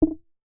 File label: warning; Microsoft Visual Studio; BuildTools